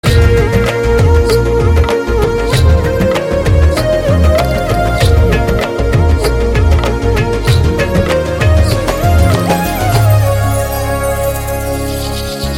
Flute BGM

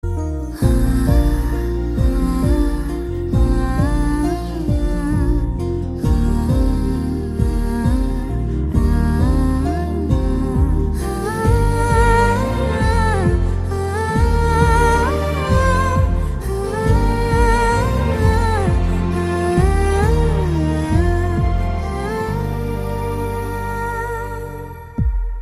Love BGM

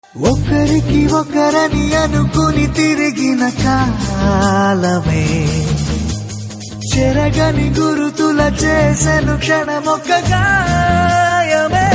Rock Version

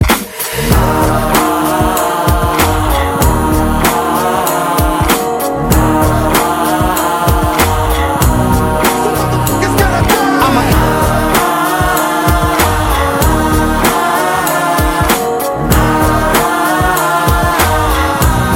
Anthem Bgm

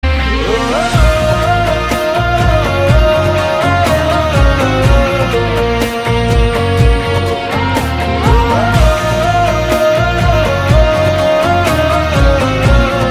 instrumental Ringtone

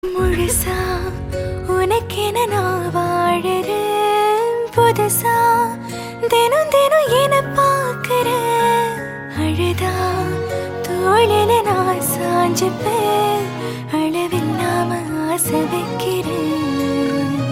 Female

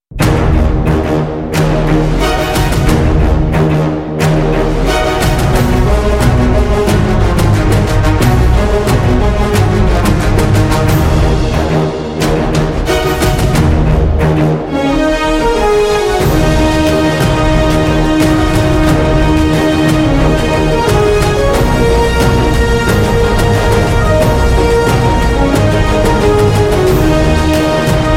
Background Score; Agent Tina

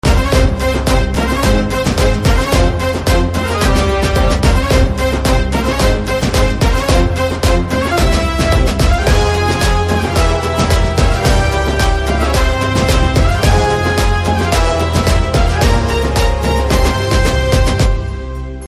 BGM; Padmavyuham

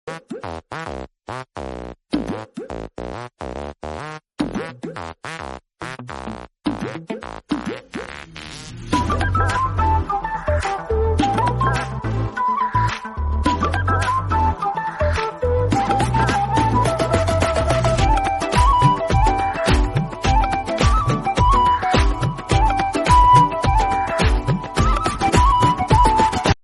Tamil Bgm

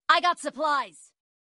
Notification tone; Battlegrounds Mobile India

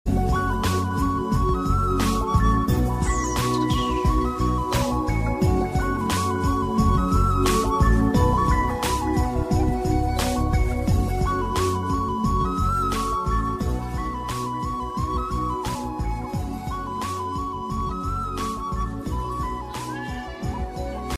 Electric Bgm

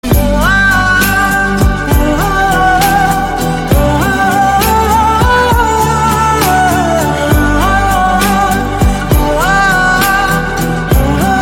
Humming Love; Payanam

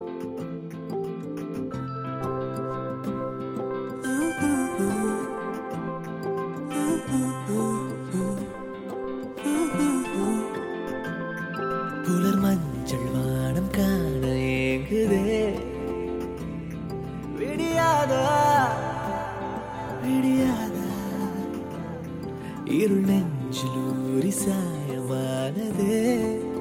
Title Track Humming Ringtone

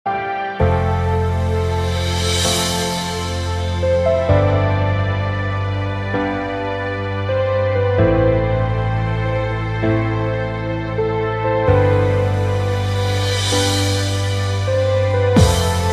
Theme Piano Version Ringtone; Battlegrounds Mobile India

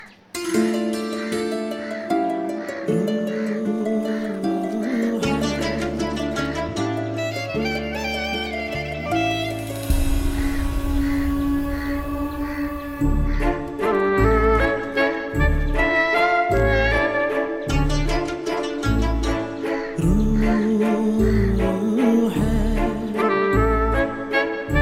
Sad Bgm; Sufiyum Sujathayum

